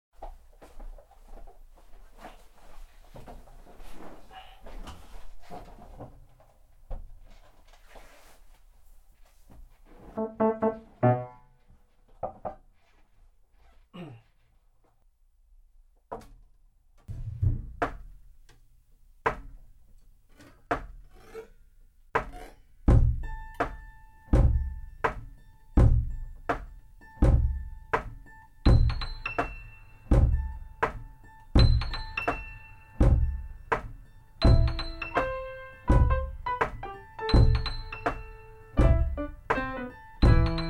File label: Piano Riding (inst.); TV 광고음악; 삼성 애니콜 보라 편